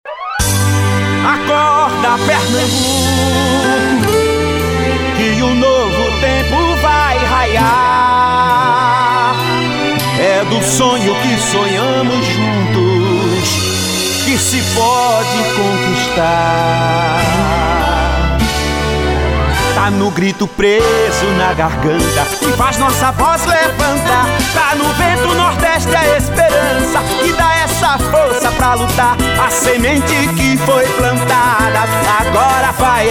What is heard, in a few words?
Jingle